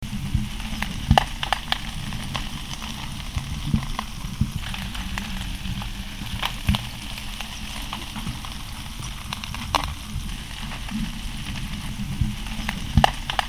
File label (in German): aaresteine ungefiltert